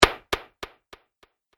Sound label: Solid Wood Hit Medium Delay; Fast Delay; Miscellaneous Impact Sound Effects; Andrew Fleming